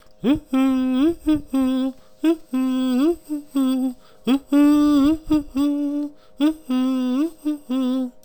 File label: mystery song